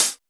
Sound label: 909 CHH 2; Drums In Your FACE!